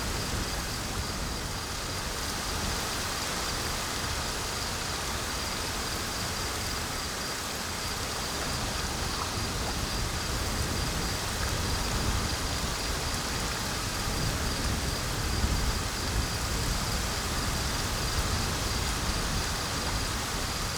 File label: ambience forest night breeze rainy; Rust